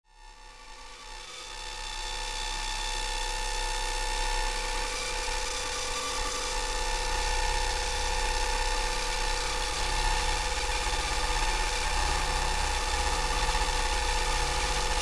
Distortion

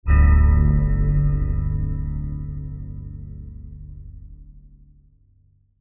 musical haunting

